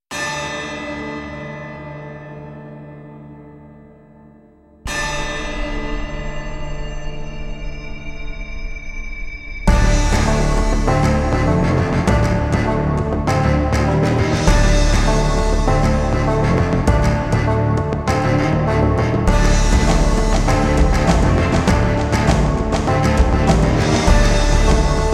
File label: Death Bell; Call of Duty Zombies